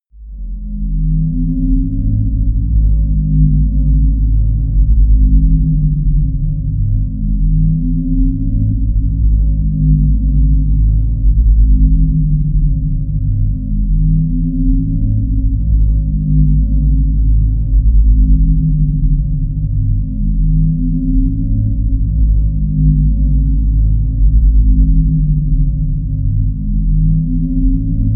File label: drone nation